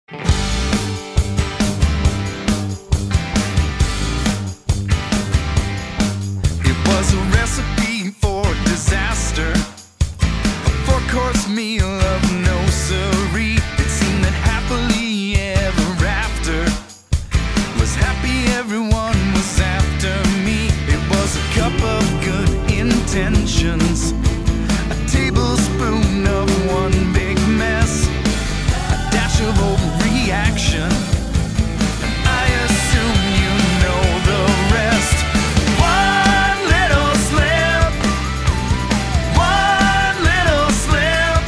karaoke; chicken little

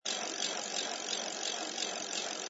bicycle; conservation catapult; project green

